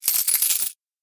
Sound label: badge unlock